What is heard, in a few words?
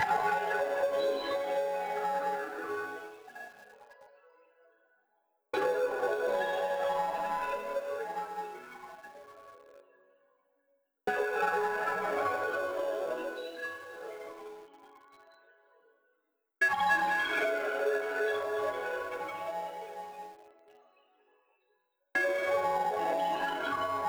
crushed echo, soundtrack; 2kki